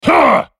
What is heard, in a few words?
effort